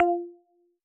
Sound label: send message; status-desktop